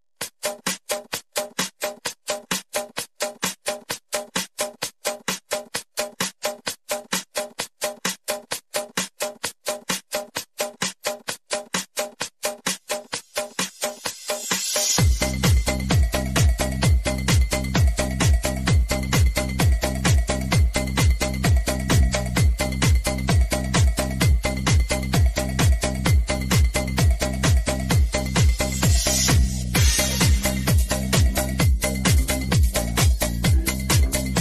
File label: no vocals